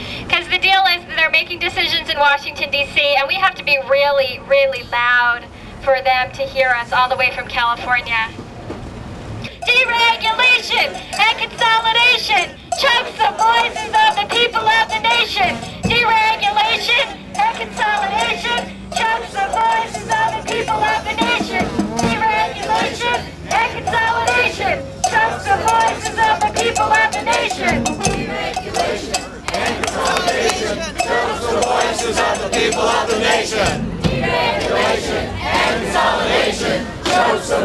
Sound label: chants; clearchannel may29 2003; sf